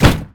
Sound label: alt fire; hellblazer